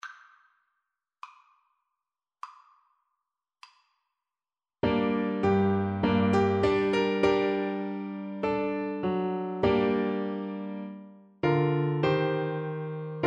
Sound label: sonata Euphonium